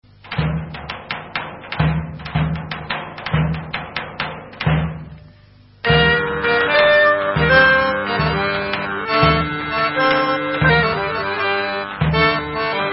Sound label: Eski, Bulgarian